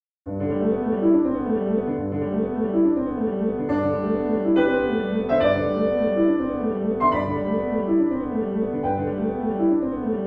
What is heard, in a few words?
F. Chopin Prelude Op. 28 Nr. 3 recital hall